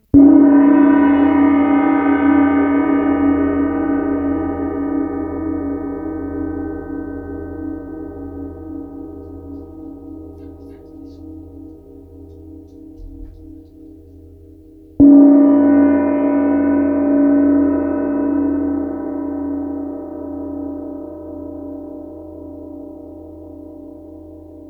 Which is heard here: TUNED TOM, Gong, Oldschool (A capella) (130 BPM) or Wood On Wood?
Gong